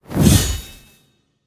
swing metal; Smite; Cabrakan

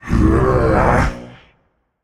ugh, voicepack; smite; jorm

